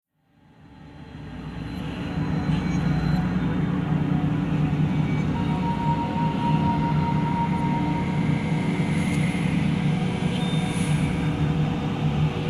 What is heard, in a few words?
Ambience